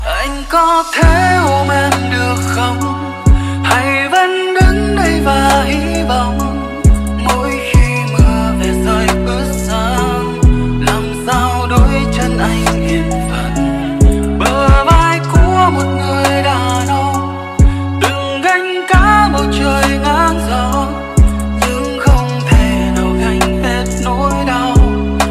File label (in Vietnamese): Nhac Chuong Lofi Ver